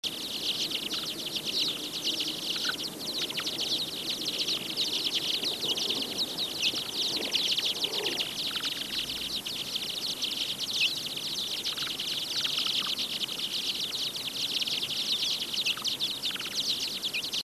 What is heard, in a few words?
freetail flight eighth speed